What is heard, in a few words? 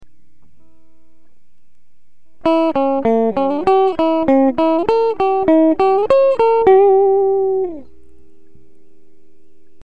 Dim, guitar; Harmony Using Chords; This is guitar 1